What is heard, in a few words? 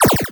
Cry